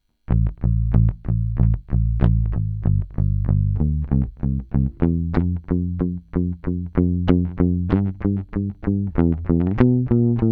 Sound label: Palm Mute Med Tona On